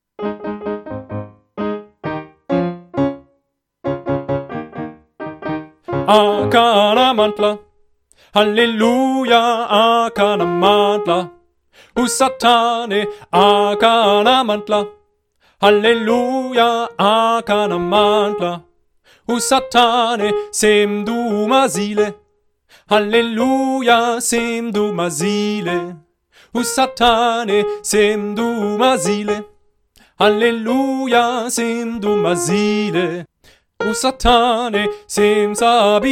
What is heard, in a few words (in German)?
Sopran allein; Akanamandla